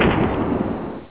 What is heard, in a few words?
Frost Arrow Hit; Warcraft 3